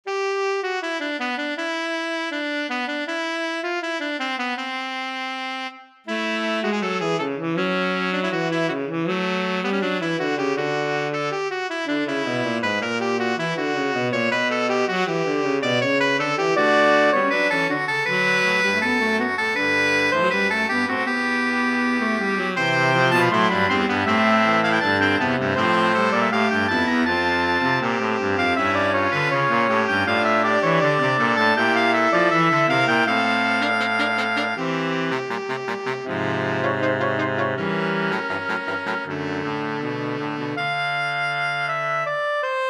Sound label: Fugue